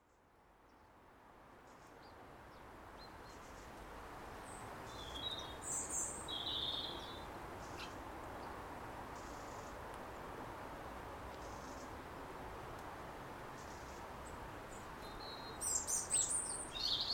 Garden Soundscape

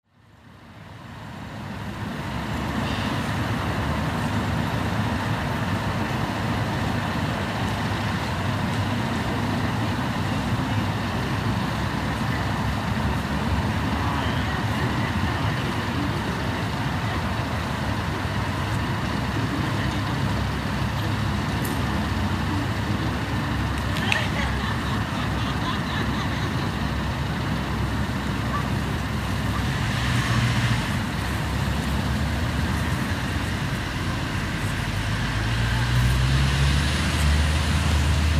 Lakeside Laughter

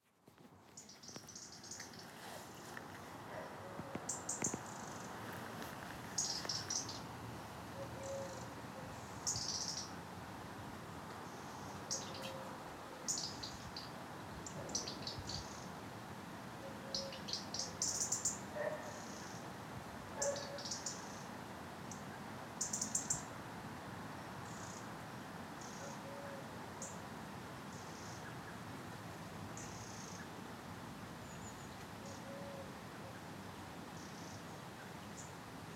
Coedbach Walk Soundscape

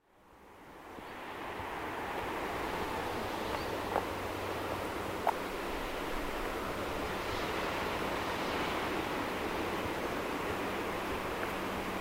Clifftop and Airplane

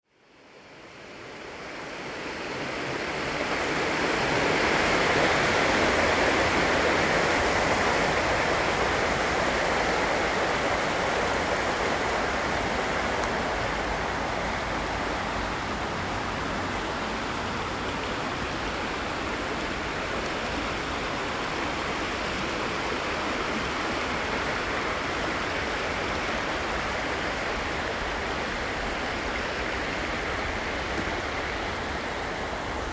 Soundscape; Cwm Dulais

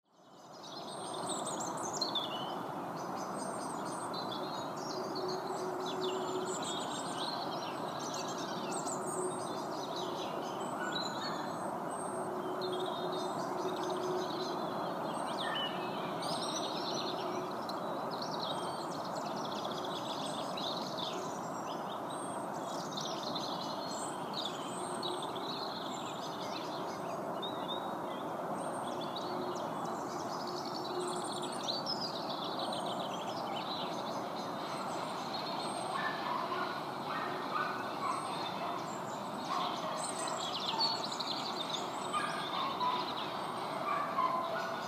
Misty Walk barking

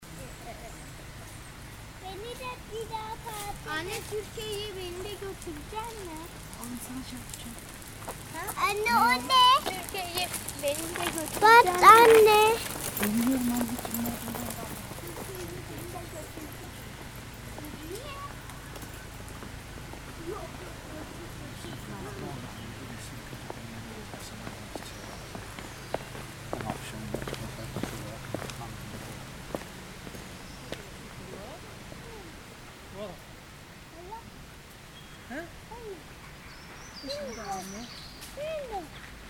Rowntree Park Soundscape

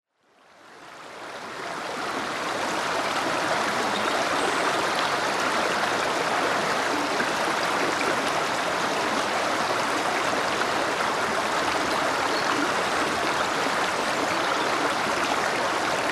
Waterside Woodland Soundscape

